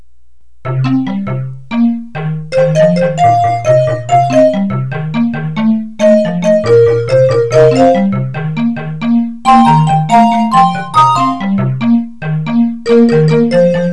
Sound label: XYLO PIPE